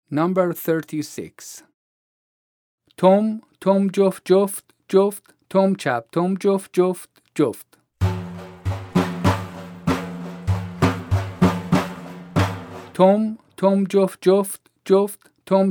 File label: vocalization and joft, Daf